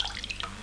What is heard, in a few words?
swim; BERMUDA